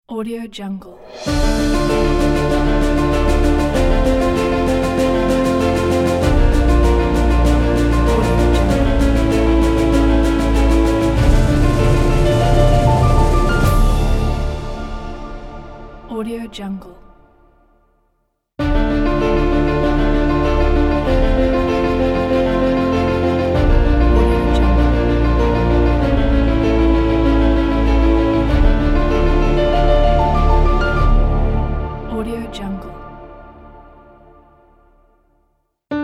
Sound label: cinematographic logo